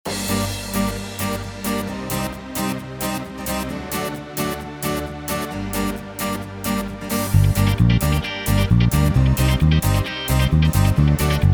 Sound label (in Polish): Style Yamaha; yamaha psr s770